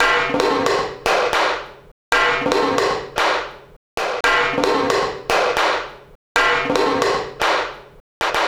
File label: Antimatter Perc, Processed Drumkits